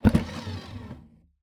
Eject, DVD Drive Internal

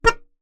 Melodica Short Note

